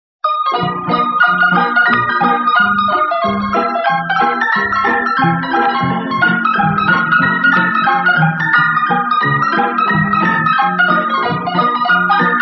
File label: wurlitzer playing piano